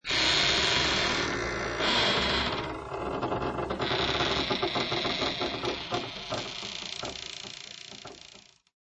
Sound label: Creak Door Or Coffin, Long metal creak and wood creak sound of opening; coffin, crypt, or heavy door; Lynne Music